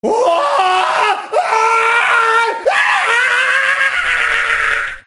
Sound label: male on fire; Voice; Neurotrauma; Barotrauma